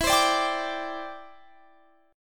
E Minor 7th Sharp 5th Guitar Drop D; chord shape

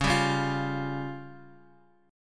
C sharp Major Flat 5th, Guitar; C#Mb5 strummed; JGuitar

